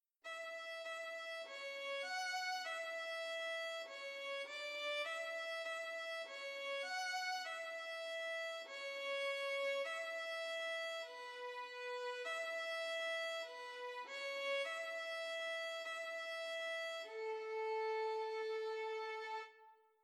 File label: Ring Around a Rosy Very Easy Violin in A Major